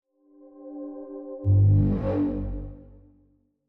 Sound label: startup sound; braiins deck intro